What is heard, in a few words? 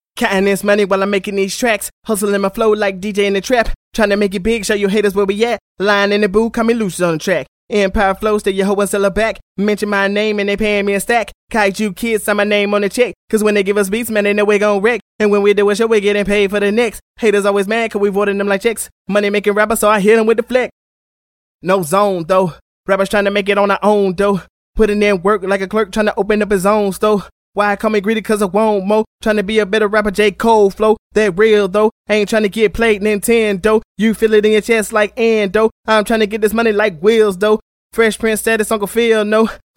rap 127 G sharp, Acapellas